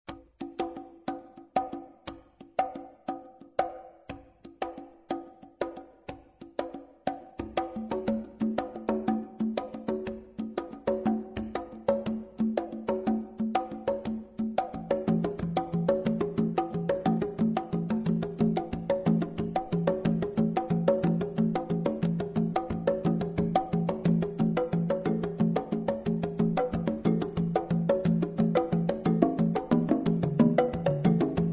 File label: Slap Shift